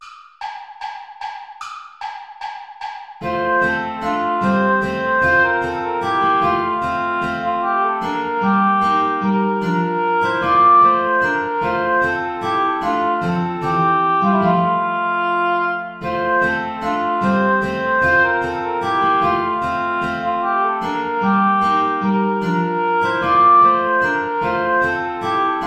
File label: play along without guitar; praetorius michael ballet